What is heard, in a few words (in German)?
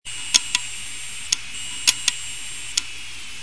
defekter festplatten schreib lesekopf